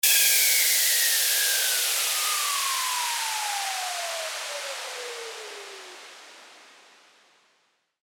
WHOOSH

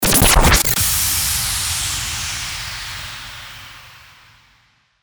FX STARTER IMPACT

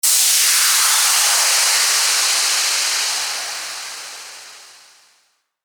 WHOOSH

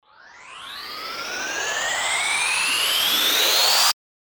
RISER

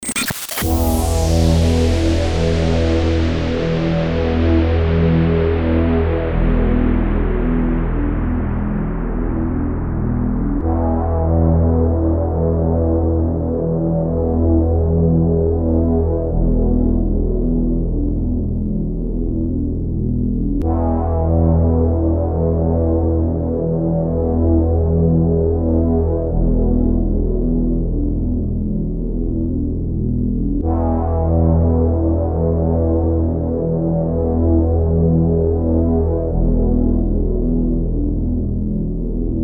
DRONE GATEWAY OPENING 84BPM EMIN

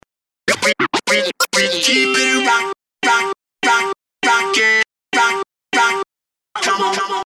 scratch 100BPM

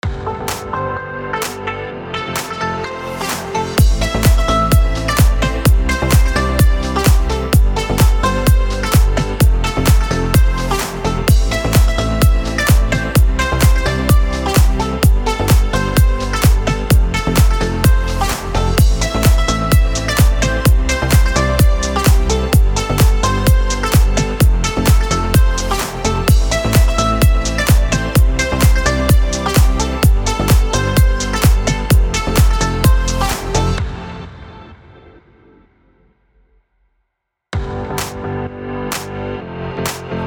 BED HOUSE A MIN 128BPM

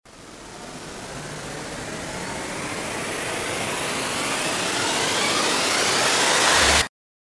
RISER

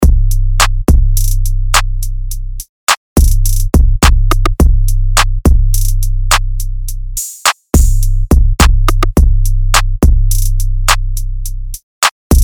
DRUM LOOP HIP HOP 105BPM Cm

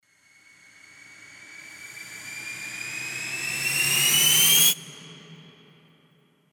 RISER

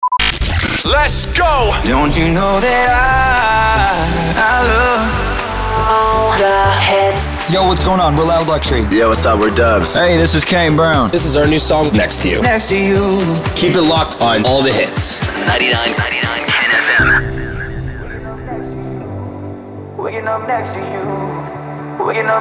POWER INTRO 119BPM; SWEEPER

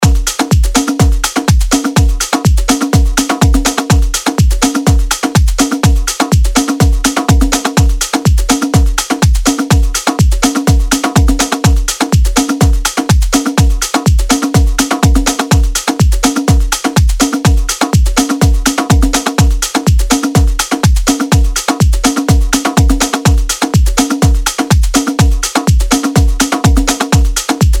drum loop Latin house 124 bpm

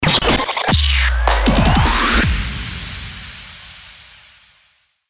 COMBO STAGER 174BPM

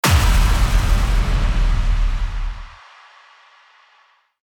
IMPACT

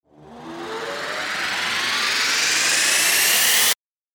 RISER

